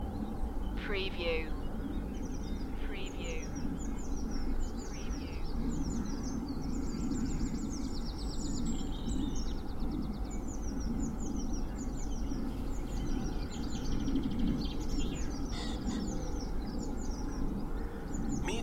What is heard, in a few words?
Four mins of Spring dawn chorus with distant traffic noise and aircraft noise; beanstalk audio